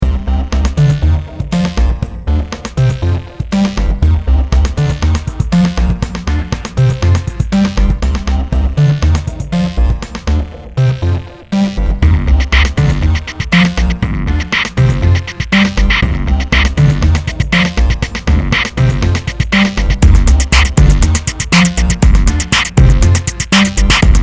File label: beat simple